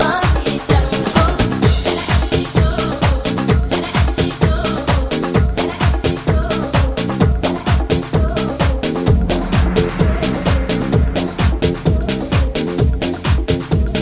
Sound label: Arabic Dance Mix